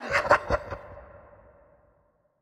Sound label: Laugh; Spinal Serve; Wieldables